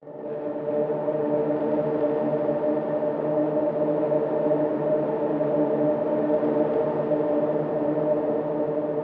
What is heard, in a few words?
loop hyrdaharp